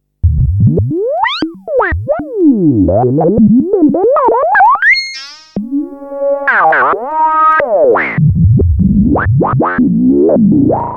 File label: Modular Fx Dry